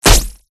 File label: abstract guns; PMSFX